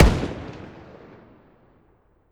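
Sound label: weapon ar fire player atmo exterior; gmod; viper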